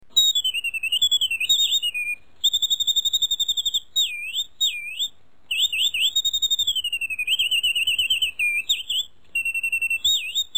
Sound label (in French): oiseau reuge tabatiere, boites musique